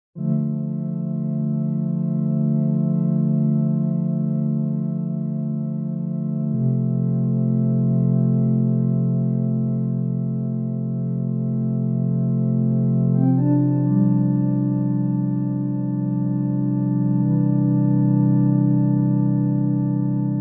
Background Music; thefinalearth2

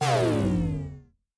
downgrade; vex6

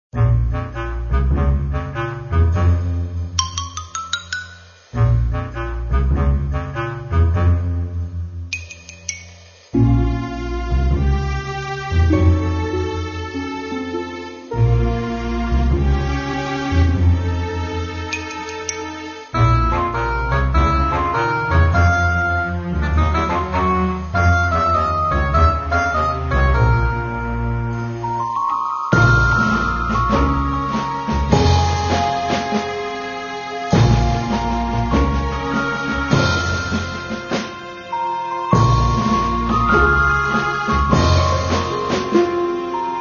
Main Menu Music; bobtherobber2

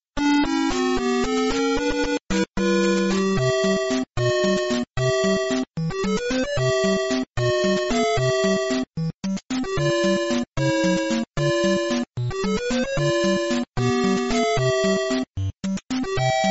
Underwater; Mario; Themes

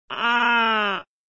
Security Alarm; Bob the Robber 2

